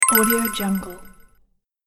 sparkling magic spell

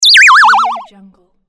game fail sound

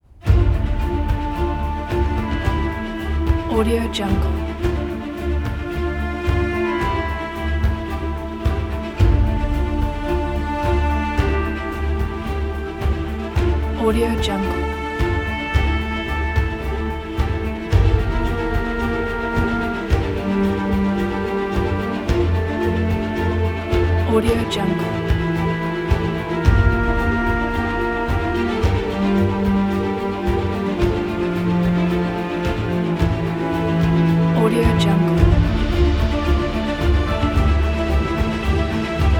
drama tense

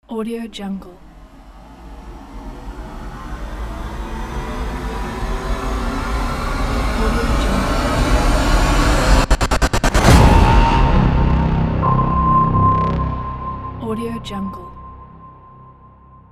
epic trailer reveal

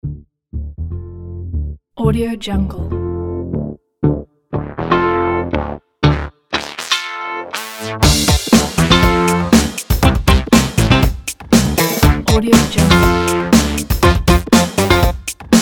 funky love catchy upbeat fun

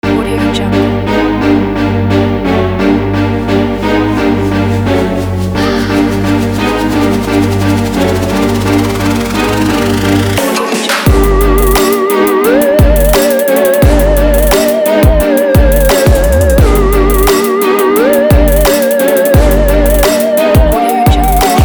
spooky halloween trap

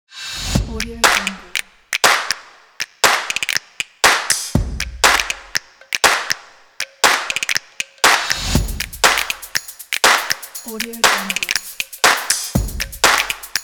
percussion stomp clap

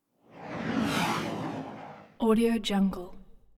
synth whooshes airy pass bys slightly phasey

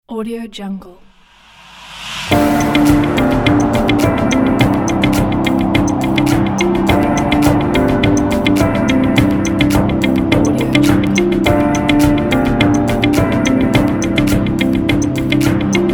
upbeat corporate tech

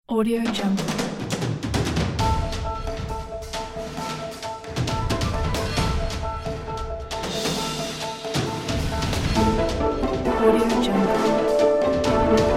secret agent action hero

